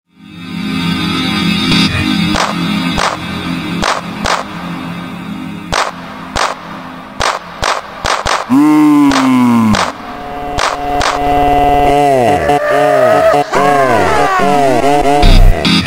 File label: super slowed